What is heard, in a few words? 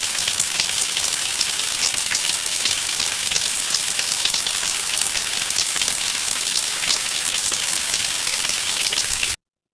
ambience rain